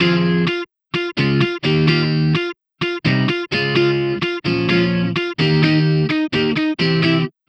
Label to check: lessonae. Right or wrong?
Wrong